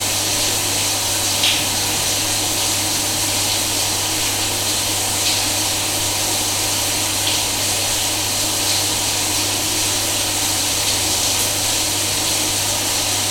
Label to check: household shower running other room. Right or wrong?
Right